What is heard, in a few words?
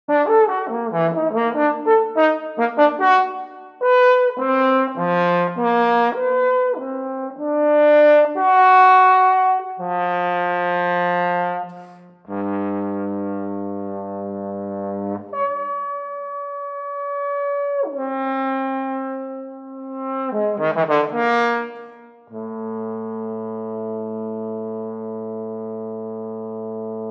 trombone